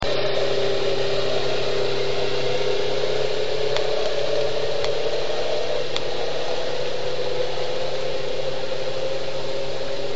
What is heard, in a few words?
boat passing distant; anthropogenic; salish sea data examples; signals